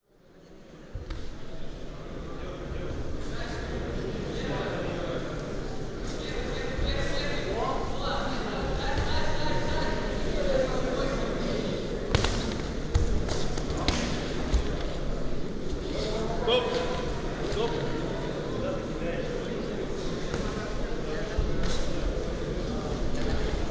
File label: boxing; luutch; sergiyev posad